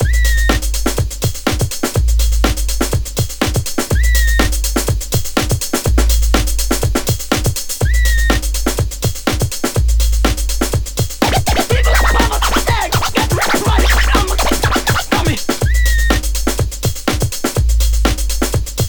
123 People Whistle, Breaks; Lockdown Breaks

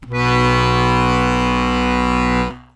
BASS B 1; Best Service; World Colours

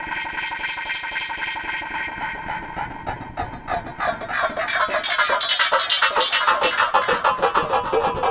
Echo Chuff Down Up, Synthetic Pulses

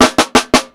PICCOLO SNARE FILL, breaks, drums; Basement drums vol 2

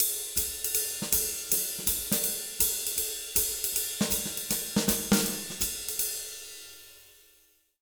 160 SWING, Jazz Latin Drumloops

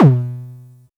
High Kicks B2; LIMAT Div Dept; Drums

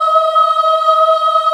D#4 CHI OH-L, Choir; USB Soundscan vol.28; Acoustic & Synth; AKAI

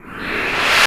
QUICK RIP, Trance Fusion, STOP SHORT; ILIO

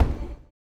STOMPS; HOW IT IS; Spectrasonics; Vocal Planet; Blues Soul

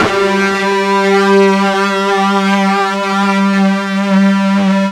FUZZ G 2, Guitar; East West; Steve Stevens Guitar Samples Collection

